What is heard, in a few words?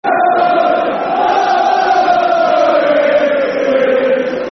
La, La, La Lalalaaaa.... Ipswich, [Football Chants (Soccer Songs); fanchants; Ipswich Town Itfc